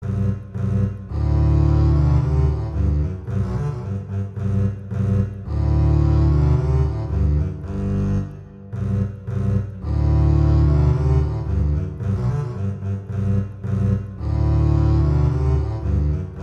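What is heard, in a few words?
Duet, horn; Mozart Duets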